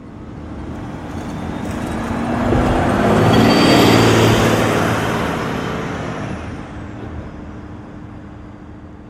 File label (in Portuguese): onibus